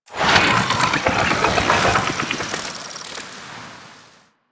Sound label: Fishing Spear Fish Spook Flying Infested; Warframe